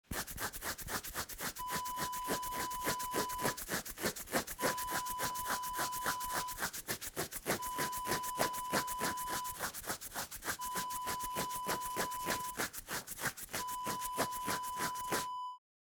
scratching skin

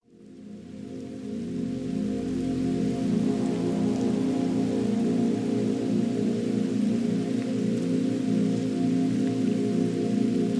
Deep Meditation

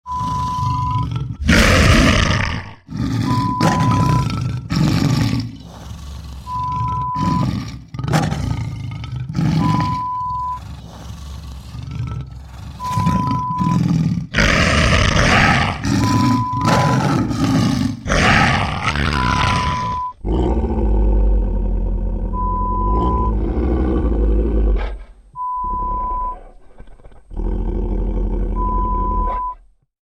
Killer Wolf, Horror Sound Effect

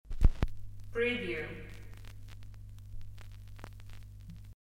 record needle set down

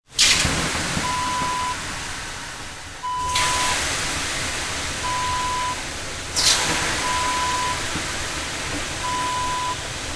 sauna water pour

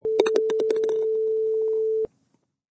Golf Ball in Hole

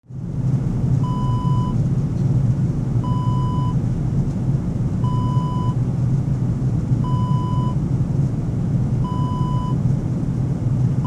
aircraft cabin

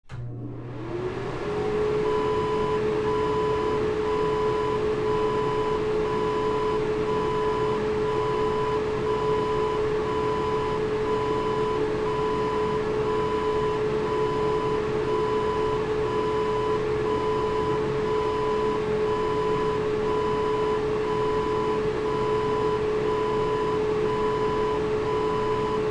bathroom fan

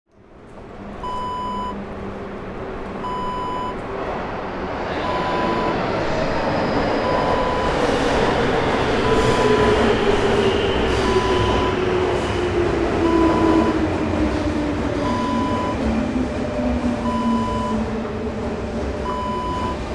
metro station trains

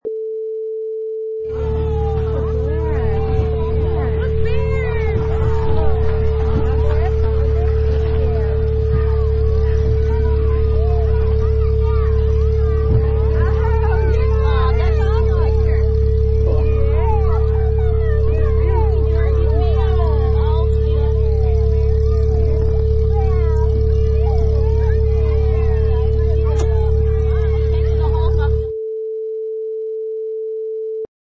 Kids Hayride

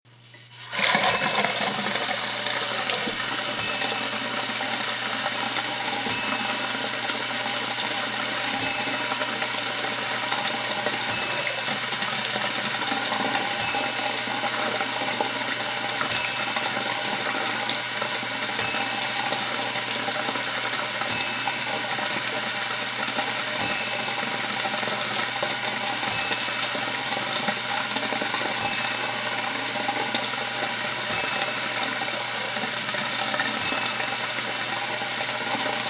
Bathroom Sink

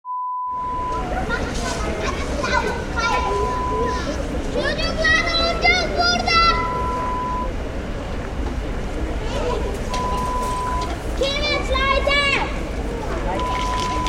Children Playing sounds

